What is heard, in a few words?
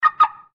Car Alarm Set Chirp